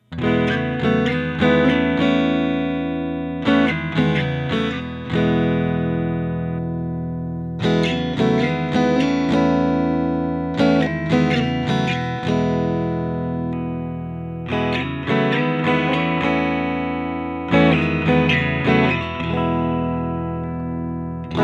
Clean Strum, pickups

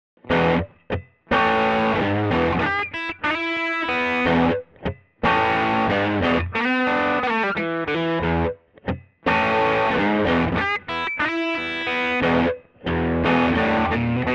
Broadway Blues, tele style pickups

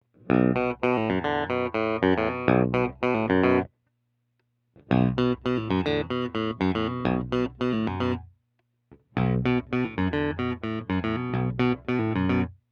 Jam Master JM 1 Baritone Clean; pickups

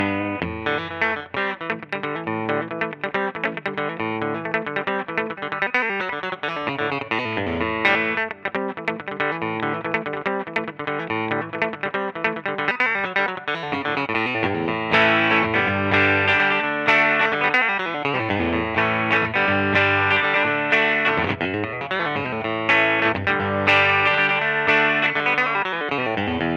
Broadway Riff, tele style pickups

